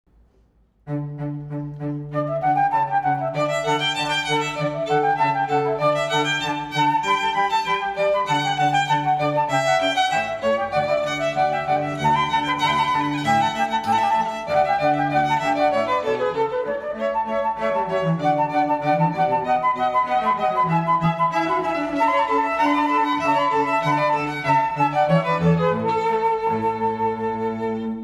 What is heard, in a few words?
Allegro (Flute Trio); Tartini; Cantilena South Florida